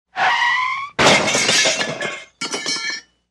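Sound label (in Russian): визг шин удар обломки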